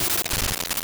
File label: noise purge